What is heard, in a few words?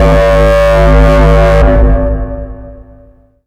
minimoog dark